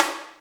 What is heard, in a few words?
PERC; ZERO-G; Datafile